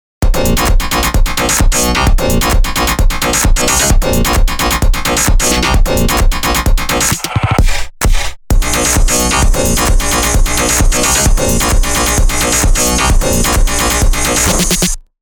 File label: beat